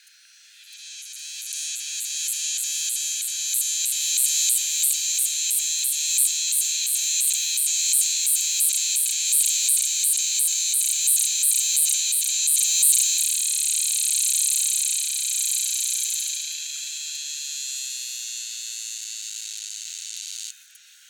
Neotibicen similaris similaris x Neotibicen similaris apalachicola hybrid, cicadas; US; GA